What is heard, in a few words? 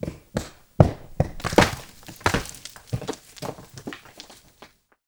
rock hit